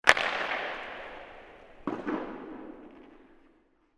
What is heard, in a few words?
puck hit hard